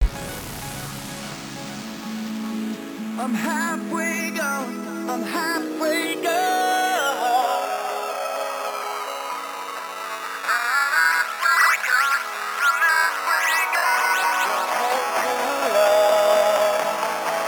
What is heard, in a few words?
Crush